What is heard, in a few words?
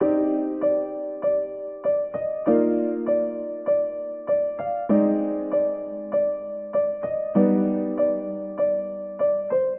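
Nostalgic Piano, C minor 98 bpm; MIDI Clips; Tonal; Motifs; Ableton; Mood Reel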